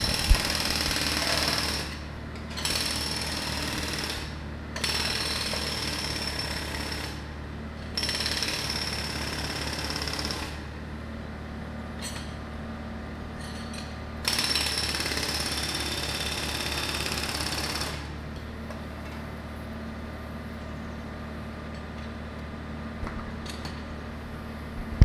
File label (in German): Pressluft Ham